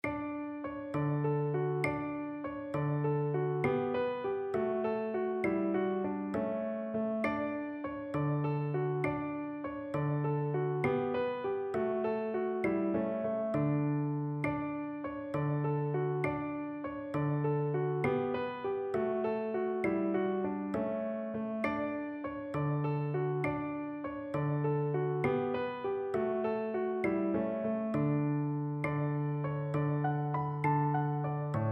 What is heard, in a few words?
audio click